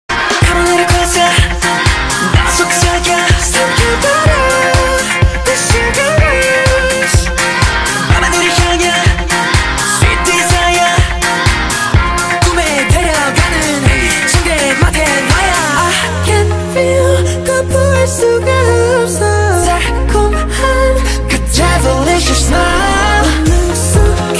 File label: ringtone jazz